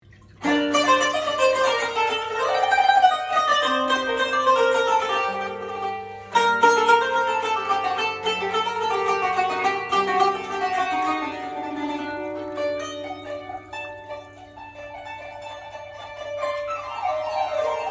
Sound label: Ramadan melody on Qanun